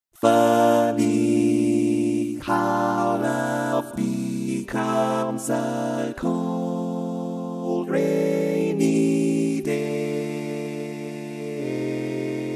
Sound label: barbershop tags, all parts